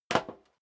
step sneaker metal dry single stair down

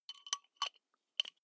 flip-top bottle rattle, gastronomie; berufe

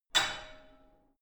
barbell only lift up hard, fitnessstudio

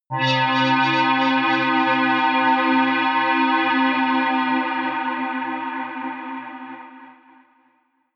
atmospheric waves high, drones

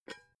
pot lid take off gentle; objekte; topf

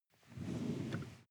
sports car int seat slide; verkehr transport; autos

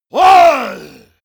monster growl attack throaty; schreie

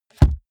car bmw e46 interior sun visor shut soft

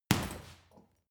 boxing punching bag hit hard; sport-freizeit; boxen

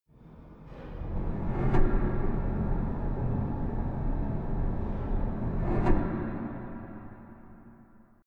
drone impacts; drones

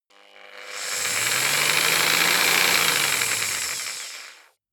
jigsaw engine slow medium length

